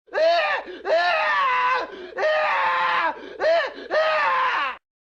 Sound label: Gruesome Scream